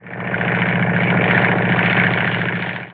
airplane med; Opartofwar

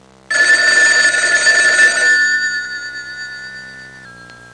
telephone; Household

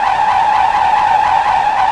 SKID; PC Gamer 49

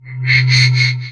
SHIELD

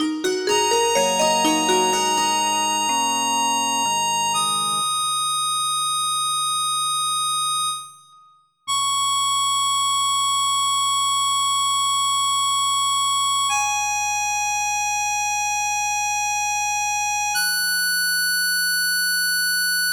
rain

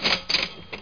sea

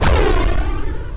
MISSILE

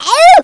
oooff; Swedish

